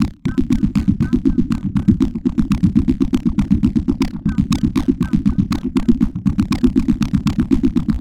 Structured Rhythm, IDM Rhythms

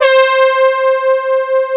OBX BRASS; East West; Global Sounds

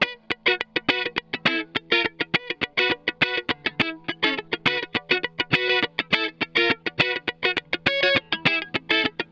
103 FUNK GTR; 103 BPM FUNK B; Drumdrops; Compact Disco

